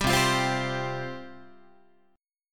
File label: C Suspended 4th C down Guitar; Csus4 strummed; JGuitar